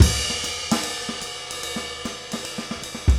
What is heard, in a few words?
5/4 jazz 150